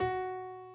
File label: key; keySounds